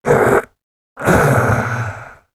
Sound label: death, voice; garrysmod